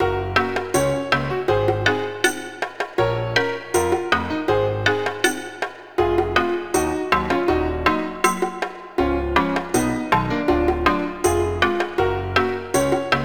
confused cave, chiptunes

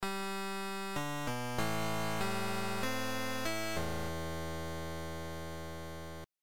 hardly like game over; juvenilia

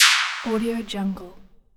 harsh laser; Ben Tibbetts Studio; audiojungle

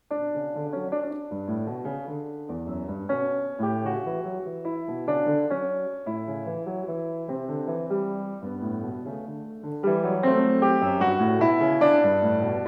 waltz

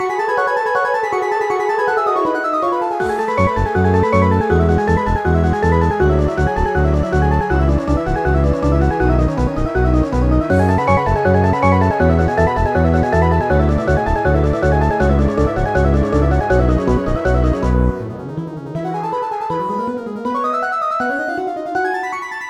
battle sketches, chiptunes

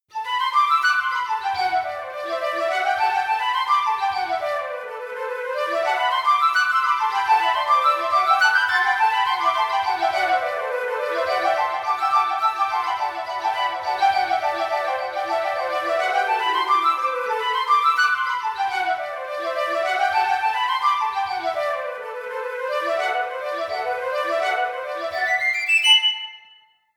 Scherzando for Flute Trio; juvenilia; chamber

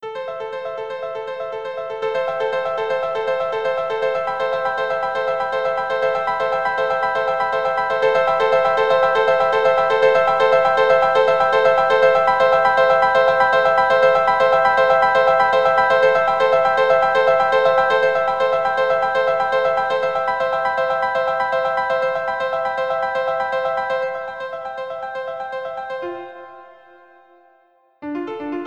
piano superplay, chiptunes

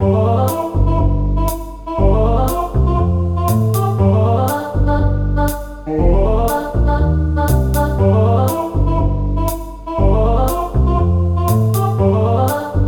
voice and bass

